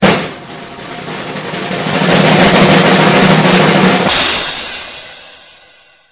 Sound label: drumroll; Drumroll (b99)